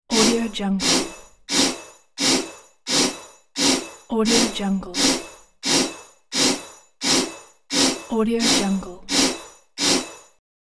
steampunk cartoon animation machine movement industry